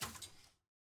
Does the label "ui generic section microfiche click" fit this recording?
Yes